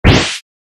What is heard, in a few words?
acceleration